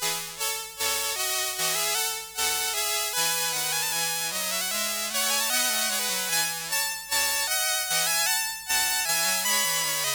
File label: Gavotte, CSG8580R5; DEMOS